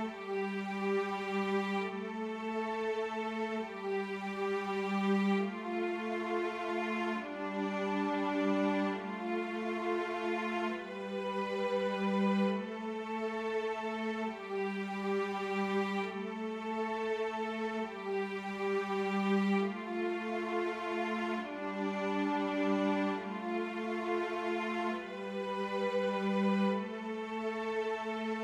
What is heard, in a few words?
strings; Adventures in Lestoria; action RPG game; Sig Productions